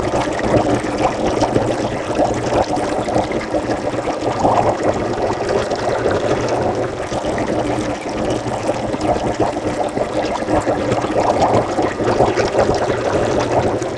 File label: Bubbling Water; Wei-Hsiang Liao